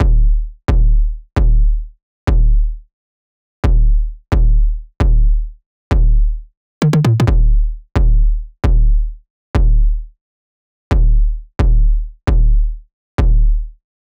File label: loop bass 132; nemesis; Seismic Loops; Bonus Bass; SEISMIC2